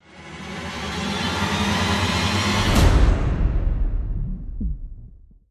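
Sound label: stinger string swell; transformers war for cybertron gamerip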